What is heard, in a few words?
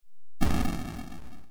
enemy is hit